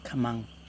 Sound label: bamboo drum; Yokoim; kamaN